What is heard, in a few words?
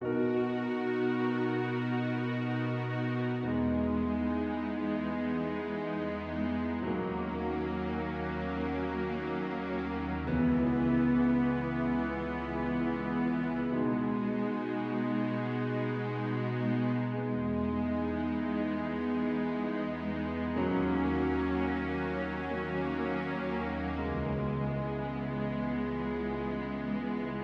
Orchestral Synth Pensive Chords, Cinematic Trailer Loops